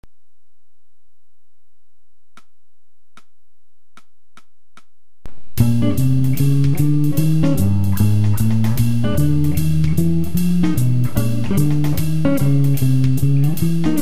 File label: walking b 150 tempo